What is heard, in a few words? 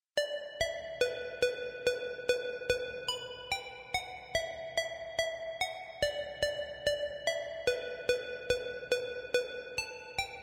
Vendor Music Lead; laser kitty pow pow switch gamerip 2019